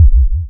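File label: waveform FM sine sine envelope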